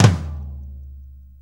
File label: 4-4 Tom Flam, Drum Loops